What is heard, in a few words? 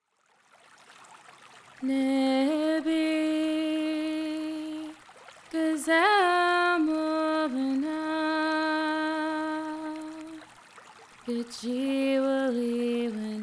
water song; nəpi